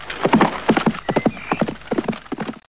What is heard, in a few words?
horse charge; szenarion